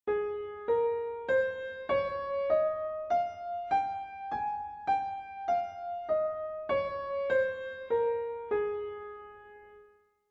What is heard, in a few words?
Ab Scale Piano; California Music Conservatory